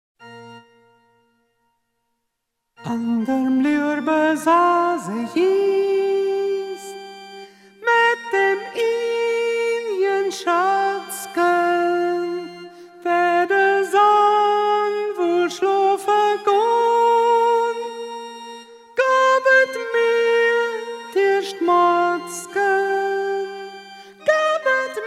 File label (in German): Sopran